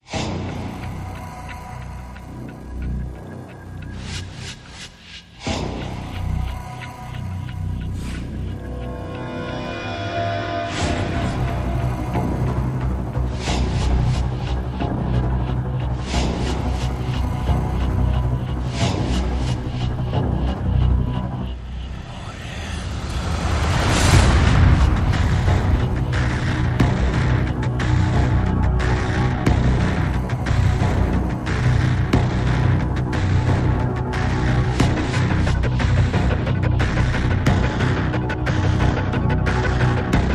Pads and Pulses

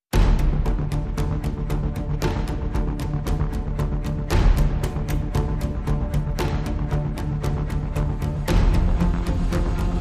Less Woodwinds FX

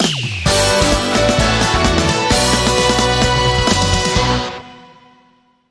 News Sting

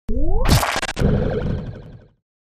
Splatter

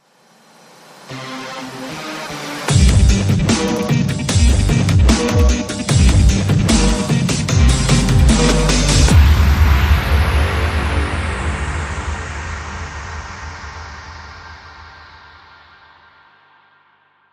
Dub Bass, Bumper

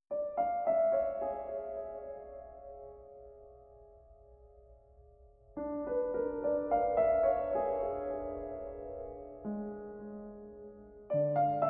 Piano Solo

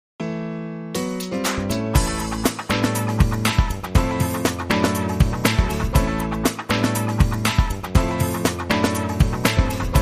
Contemporary Groove

